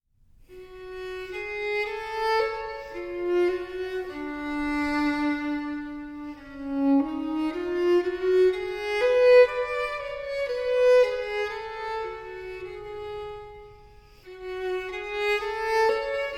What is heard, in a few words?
Partita For Flute In A Min; Bach : Partita for Flute in A minor BWV1013 – Sarabanda